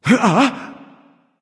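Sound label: nonverbal surprised; smite; voicepack; ullr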